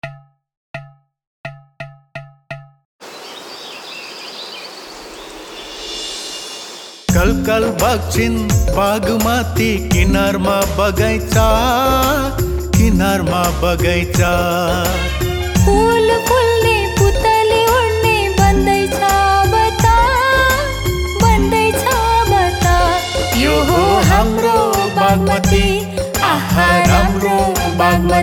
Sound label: Jingle